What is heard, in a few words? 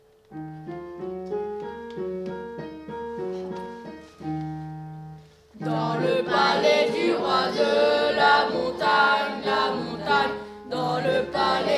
tutti